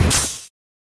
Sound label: thruster extinct; vanquish